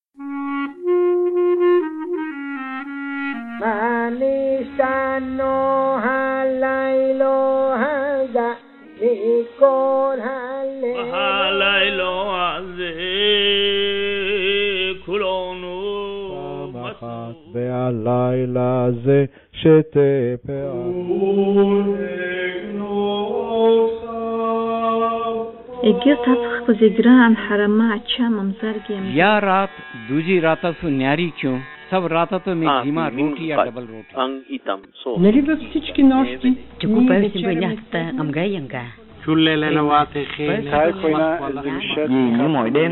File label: manishtana multilangue, Chants du Seder de Pessah